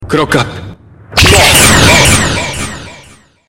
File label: clock up, sms; ringtones